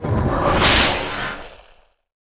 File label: Yggdrasil War idle